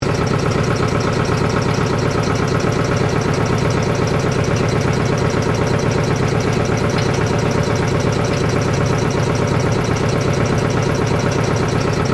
Industry, Air, Compressor, Run, Motor, Underneath, Perspective, Loop; Air Pump